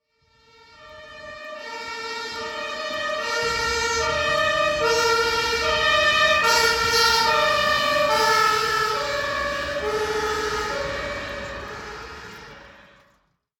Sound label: German Ambulance